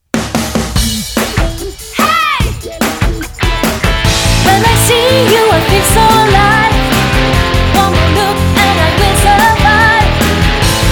Chant Poprock; Court metrage pour la SNCF

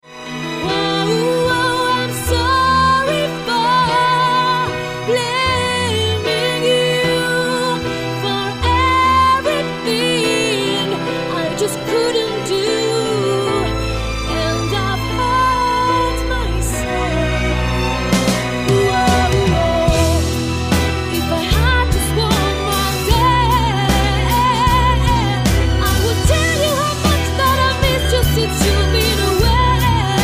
Chant Poprock Reprise

